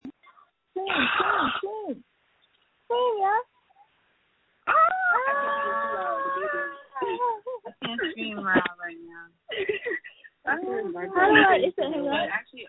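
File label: screams that feature laughter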